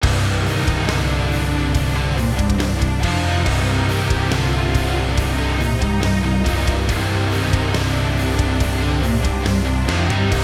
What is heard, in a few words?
Orchestral Rock Chin Up; Orchestral Rock Vol 2